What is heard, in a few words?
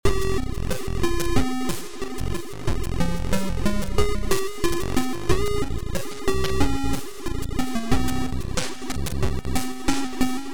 Radio fm